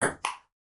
ping pong, notifications

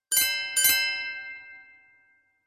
sms; Pantech Breakout

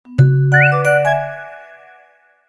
Cheerful whistle; Samsung; Galaxy Ace SHW-M240s

